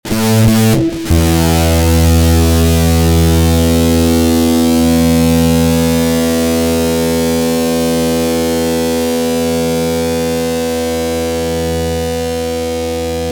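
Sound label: fuzz guitar mono dry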